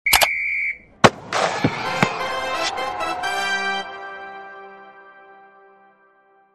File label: win out